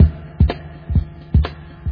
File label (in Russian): solo na barabanah